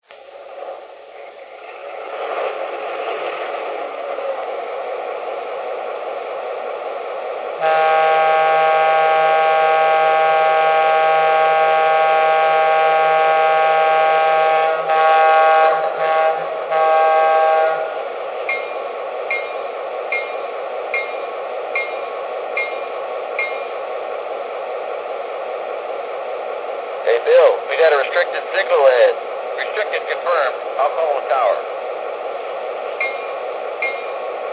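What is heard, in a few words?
Trainmaster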